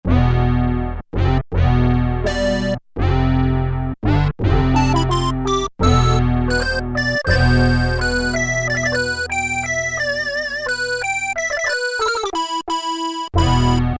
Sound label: lead; E-mu Orbit V2